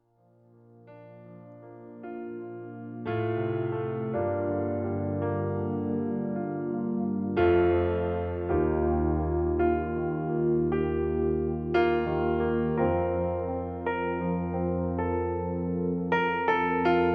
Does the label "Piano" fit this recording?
Yes